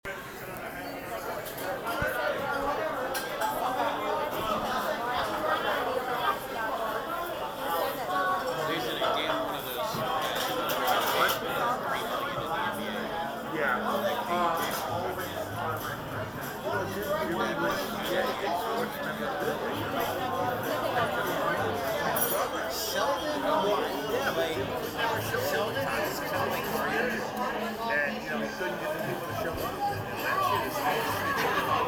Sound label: Crowded bar